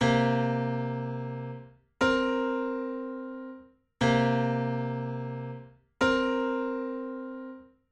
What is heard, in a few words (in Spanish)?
Intervalo armonico septima menor